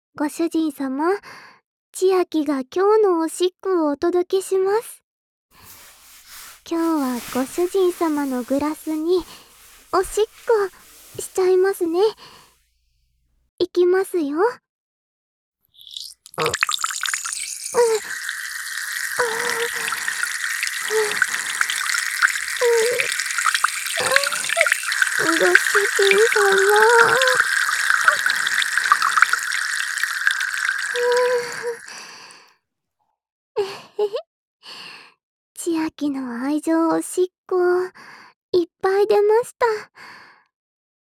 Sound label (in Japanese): グラスにおしっこ